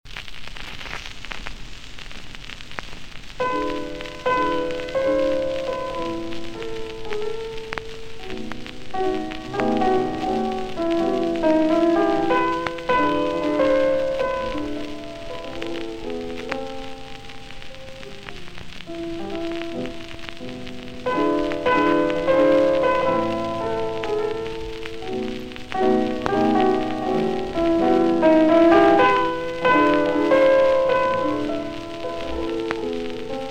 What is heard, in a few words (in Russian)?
Мазурка ми-минор; Довоенные грампластинки